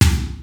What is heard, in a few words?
TEKNO; Rubber Chicken Software Sample Bank